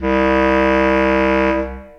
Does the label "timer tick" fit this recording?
No